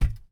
FOOTSTEP - Metal Grill Run Barefoot Male; Timothy McHugh; Barefoot on Metal

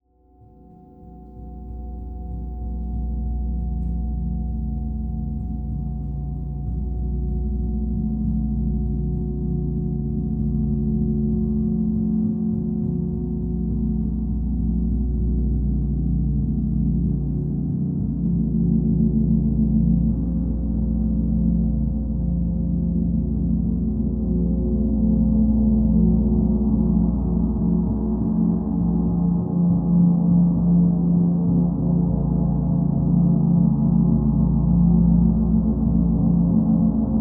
Gong, Raw, Stinger, Drone, Cymbals; Cymbals From Hell Vol 2

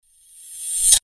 Sword Sound; FXHome; Fighting Sounds

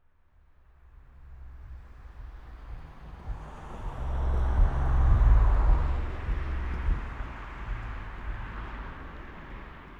EXT Car By with Music; Coll Anderson; Music Drive Bys; EFX

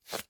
handle Small Leather; Kenney's Sound Pack; RPG Sounds

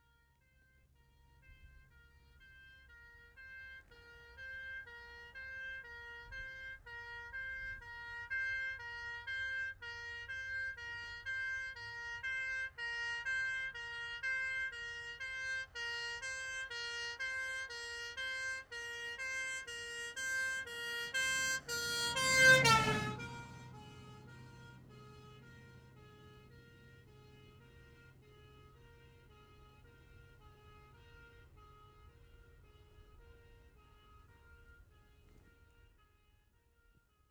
Ambulance, Pass R To L With Two-T; Emergency; BBC Sound Effects Library

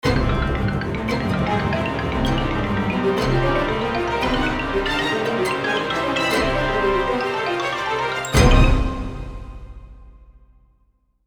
Orchestral Phrase; FXHome

